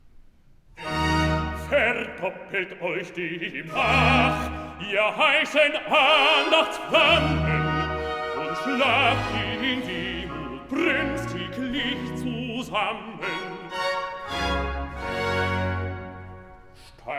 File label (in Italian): Recitativo (Bass); Xmas Day; Cantata, BWV 63 'Christen, ätzet diesen Tag'; VI. Recitativo (Bass) 'Verdoppelt euch demnach, ih; Bach; Weimar; Leipzig